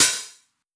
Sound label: Open Hat Hit, 909 Open Hats; Slate; Vintage Drum Machines Volume 3 909 Edition